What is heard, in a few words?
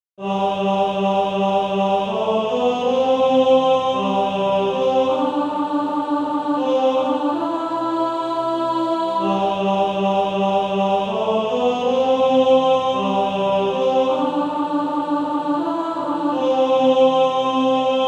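Male Choir, tenor; Redeemed How I Love To Proclaim It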